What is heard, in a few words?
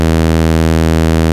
2600 E3, ARP 2600; Hollywood Edge - Giorgio Moroder Rare Synthesizer Collection